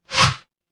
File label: ui swipe